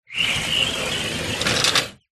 household window thin garage sliding glass

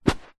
footstep single foot in socks on carpet; zapsplat

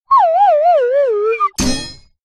cartoon fall slide whistle descend impact

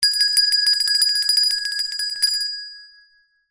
bell small hand ring short

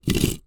foley concrete block movement hard ground; zapsplat

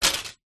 plastic shards broken thin pieces set down; foley

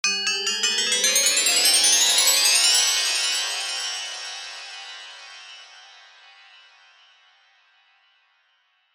magic spell ascending metallic pad

